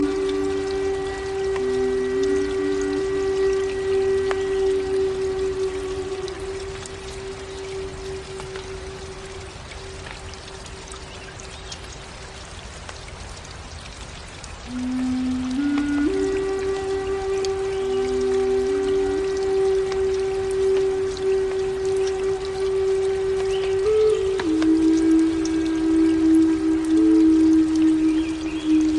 soothing flute; mobile-app-chat-app

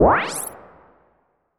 balloon boing; balloon-man

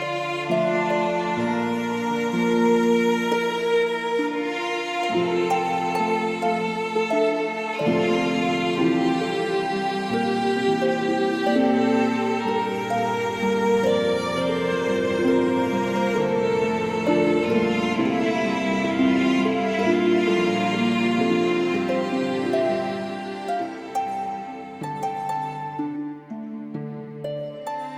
Outside Music; Platformer

